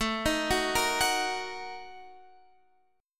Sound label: A sharp Major 7th Suspended 4th Sharp 5th